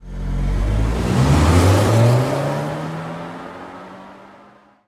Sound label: car speed up